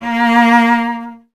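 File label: strings cello A#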